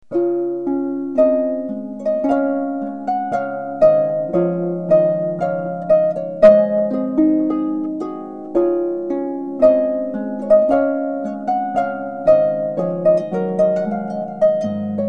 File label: Trumpet Volantary, harpist; Purcell